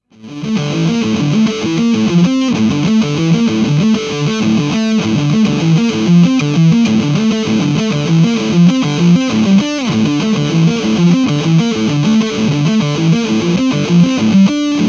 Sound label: uk metal